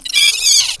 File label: hurt